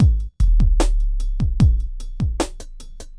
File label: hiphop